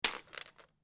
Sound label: Lego, Single Lego Piece Drop Onto Pile Of Pieces